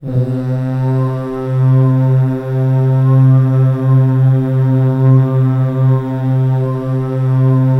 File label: DRONER, HUMAN SYN; Spectrasonics; Vocal Planet; Jazz FX